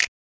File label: Snap, Hip Hop Kit; drums; STYLE KITS (Hip Hop, Etc)